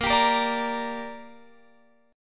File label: Bb 6th Bb, Guitar Open F; strummed; x,17,19,17,17,17